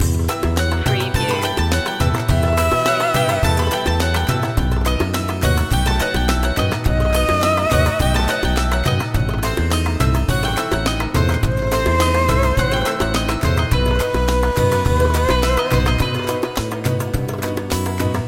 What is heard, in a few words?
middle eastern oasis loop